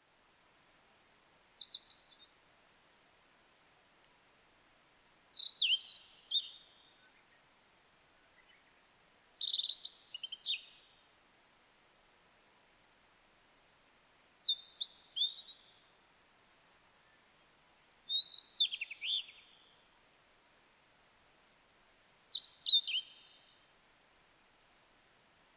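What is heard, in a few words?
ooruri, blue-and-white flycatcher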